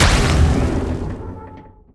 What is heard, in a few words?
click